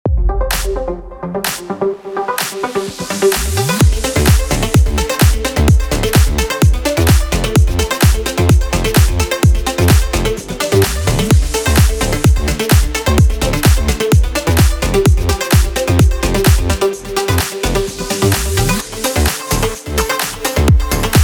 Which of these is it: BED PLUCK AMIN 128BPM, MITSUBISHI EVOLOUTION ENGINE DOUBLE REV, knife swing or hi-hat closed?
BED PLUCK AMIN 128BPM